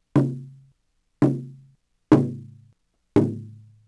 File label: drum, Mapuche